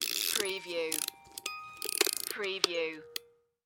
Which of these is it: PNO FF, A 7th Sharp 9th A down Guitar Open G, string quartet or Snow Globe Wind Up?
Snow Globe Wind Up